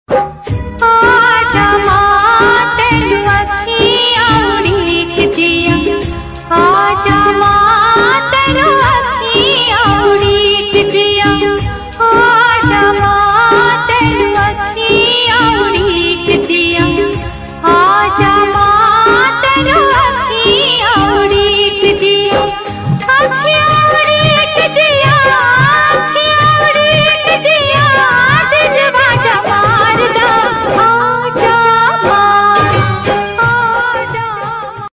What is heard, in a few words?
navratri ringtone; mata rani ringtone; durga mata ringtone